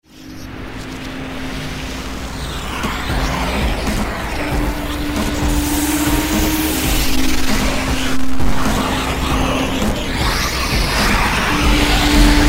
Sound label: fire sample